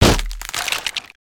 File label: Wood Splinter; destructibles